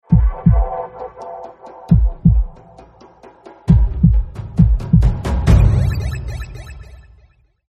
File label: loading round healing